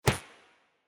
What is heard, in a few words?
ambience room secret room wisp smash